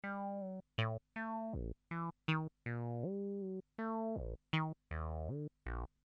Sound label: MIDI Bass 303